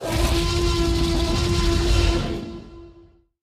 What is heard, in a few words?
T rex Roar